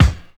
clicky kick drum single shot e key, bass drum tuned to the E note; kick drum samples